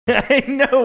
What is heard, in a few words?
laughing saying i know